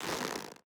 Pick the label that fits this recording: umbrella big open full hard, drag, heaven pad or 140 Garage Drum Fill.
drag